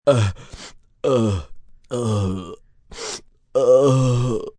Sniffle Sick Guy, Sniffle, male, plugged nose (stuffed-up) (human voice); Sniffling; VoiceBox